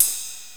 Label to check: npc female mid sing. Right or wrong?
Wrong